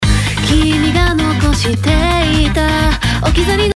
Guitar Cutting

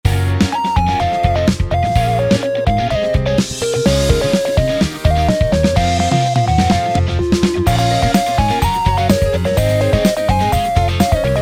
Drums Snare

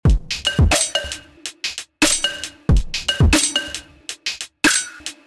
Drum Filter

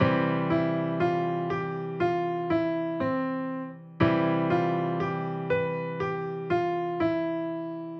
piano

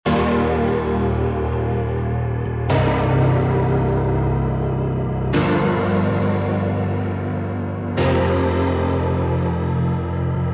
Piano Lofi

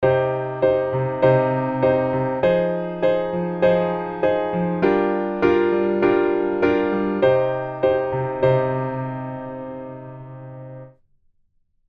Cm Fm7b5 Gm7 Cm

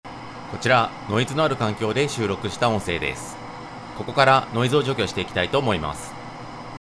noise

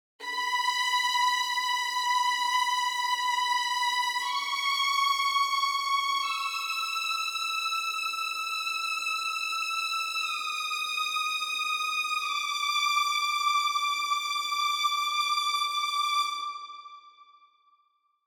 Vln

